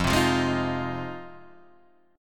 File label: F Minor 7th F Guitar Standard; strummed; JGuitar